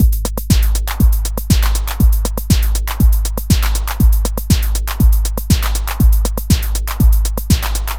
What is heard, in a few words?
120 trance beat, Drum & Perc Loops